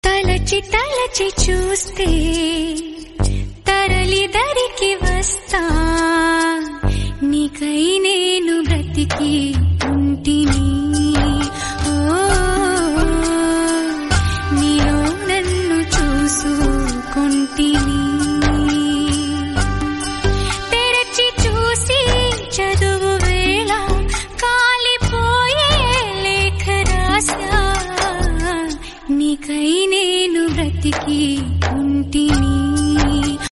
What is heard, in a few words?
female version